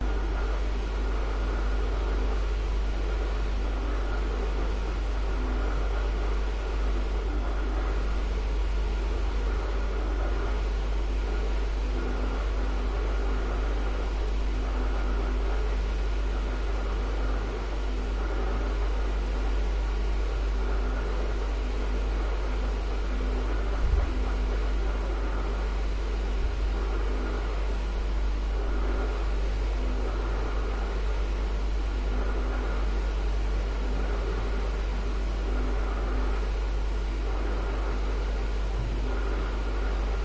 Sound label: Greek Apartment Roomtone Kitchen; your free sounds